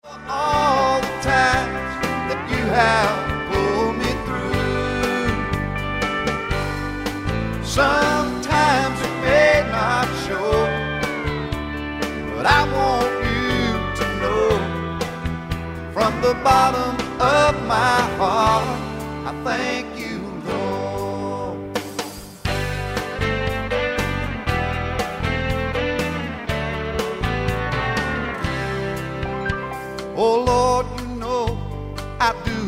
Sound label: Country Gospel 4 4 medium tempo 8th note feel